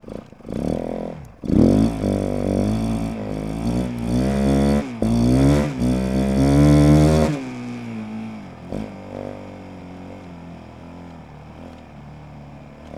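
Suzuki, RMZ450, Onboard, Drive, Gearshifts, Engine, Intake; 2018; DPA4062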